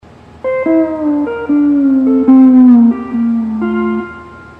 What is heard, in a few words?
hawaiian piece